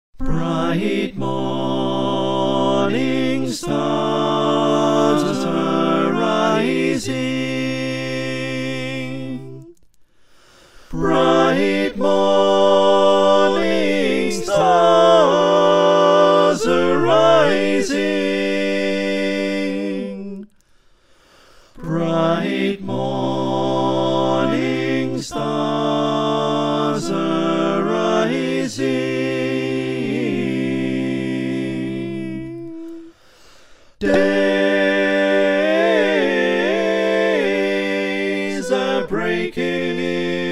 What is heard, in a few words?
SATB, choir